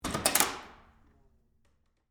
Door Open Public, Foley, Door, Open, Public, Bathroom, Reverb; Miscellaneous Door